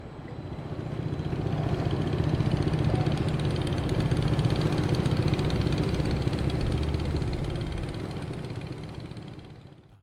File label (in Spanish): barco arranque